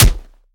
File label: melee character fist; viper